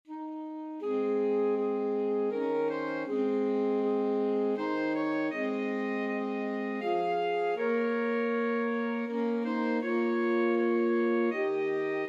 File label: away in a manger, alto sax trio